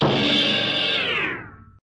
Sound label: kick crash benddown hit, Cartoon FX